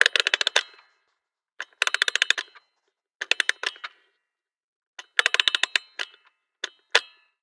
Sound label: music box wind; devices accessories utilities; NS Studios - ultra sound collection